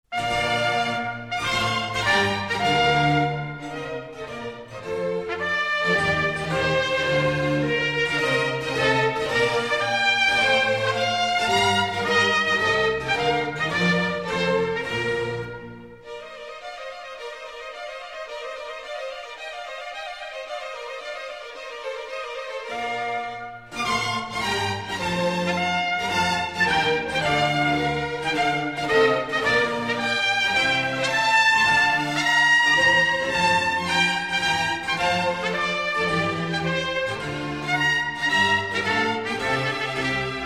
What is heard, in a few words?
Concerto for Trumpet n.9, I, Trumpet; Georg Friedrich Haendel